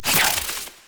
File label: Scythe Spin, Weapons; Stalker Jade Scythe; Warframe